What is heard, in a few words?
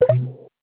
resume call, Skype WiFi Phone; Netgear; SPH101